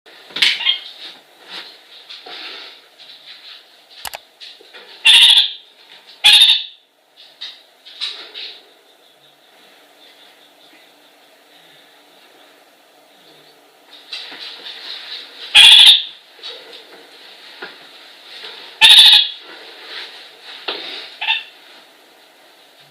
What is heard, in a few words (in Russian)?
kakariki zvuki; cyanoramphus malherbi